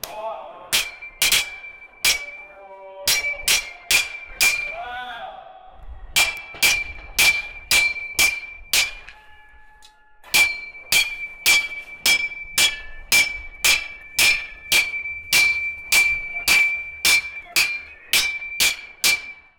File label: ambient of a warriors pra